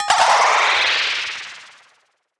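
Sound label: deep house FX, 125 bpm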